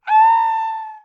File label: ahh; Drunk Invaders